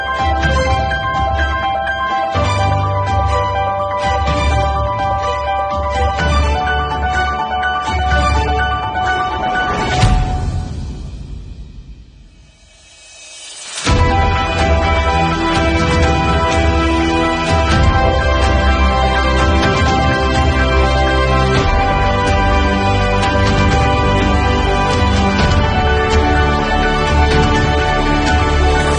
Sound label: TONE RINGTONE; ONEPLUS 3